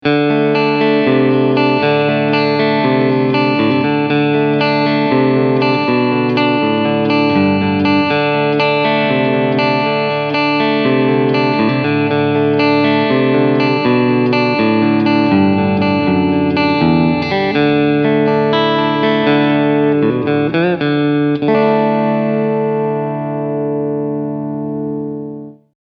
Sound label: Middle SC Clean Picking